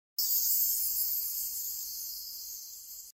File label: magic; fortnite emotes